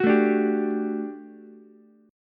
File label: G Major 7th Suspended 2nd G down Ukulele; GM7sus2 strummed